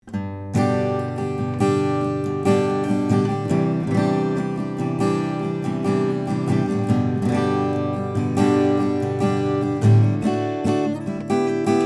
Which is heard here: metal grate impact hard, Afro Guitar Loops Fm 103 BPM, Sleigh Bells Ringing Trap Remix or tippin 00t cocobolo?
tippin 00t cocobolo